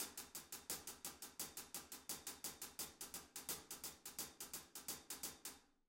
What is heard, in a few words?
16 straight and 16 swinging